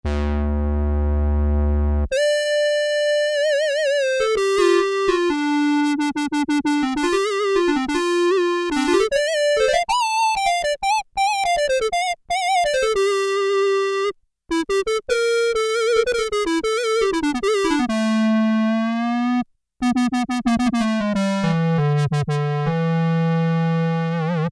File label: Korg Poly-800, Square Wave Lead